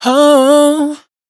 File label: riff hoa Am, Dry Vocals; Raw Vocals; Slate Digital Omnivox Vocal Sample Pack